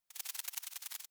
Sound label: critter move